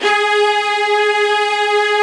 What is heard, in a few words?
strings G#3